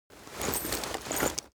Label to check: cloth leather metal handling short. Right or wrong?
Right